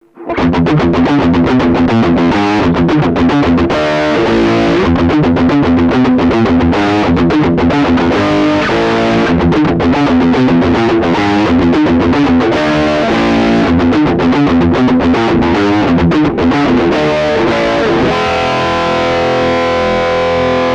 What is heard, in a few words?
eclipse br neck drive, tech21; eclipse active